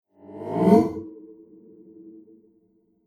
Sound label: Future Vroom, whoosh sound effect